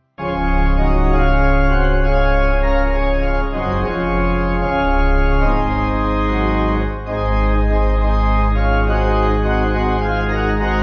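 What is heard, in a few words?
Pipe organ, church music backing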